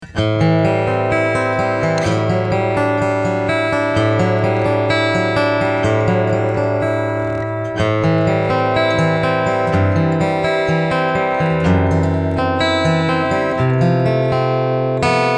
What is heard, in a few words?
Picking EQ 50